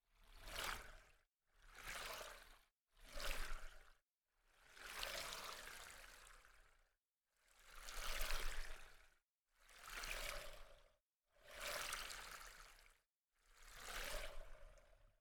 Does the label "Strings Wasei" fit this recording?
No